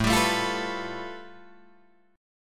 A 7th Suspended 2nd Sharp 5th A, Guitar Drop D

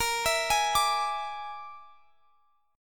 E 7th Flat 5th; chord shape

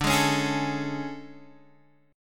A Major 7th D

